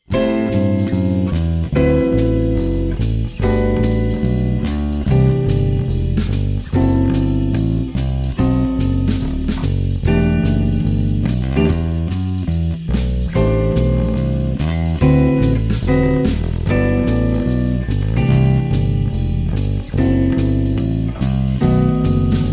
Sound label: bass; Bass Style Examples